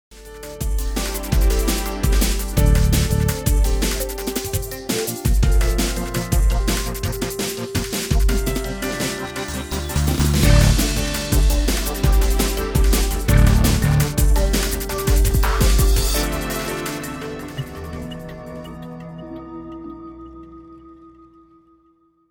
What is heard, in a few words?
electronica